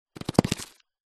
animals rodent mouse rat scuttle across cardboard box startled; zapsplat